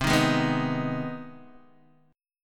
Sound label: C Minor Major 7th Sharp 5th, Guitar, DADGAD